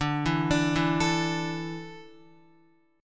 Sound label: D Suspended 2nd D, down, Guitar; arpeggiated